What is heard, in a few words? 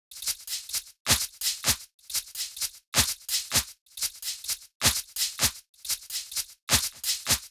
Drum Loop Cymbals 128BPM; modern bass house